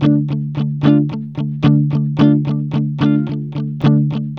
ROCK, Vintage Blues Guitar; USB Soundscan vol.22; AKAI

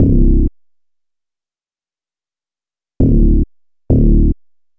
Residual Bass; Sonic Foundry (Sony Creative Software); Loop Noir

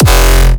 Powerstomp Kick

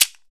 PRC WOLOF, Afro Shakers; Roland LCDP14 Africa VOL-2

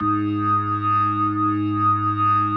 B3, First Call Vintage Keyboards

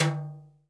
brush; Northstar - New Gold VOL-2; 30S BRUSH

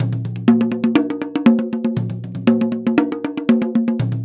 PERCS 120BPM; INIS - Opium

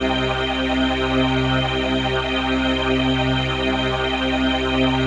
PHASE PAD; Best Service; XX Large Pads; Sample CDs

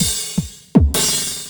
LOOP, 160 BPM, Drum & Bass

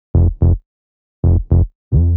110 Fm, Melodic Loops; M8; Electronisounds

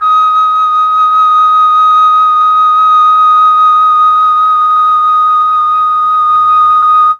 flute; ILIO; Propeller Island Legendary M400

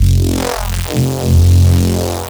Reese Bass F; Antidote Advent

